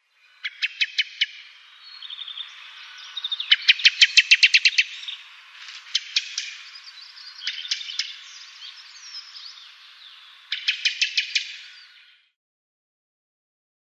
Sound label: Black throated Thrush calls